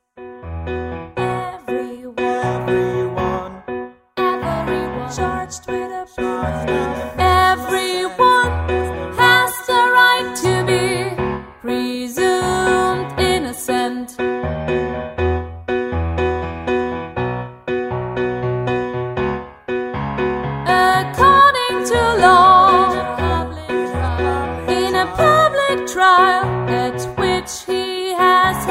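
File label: soprano; sing human rights article11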